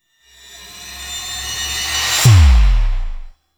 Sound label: Cave Figurine; Warframe; Duviri; Cinematics; Doll Assembly; Teshin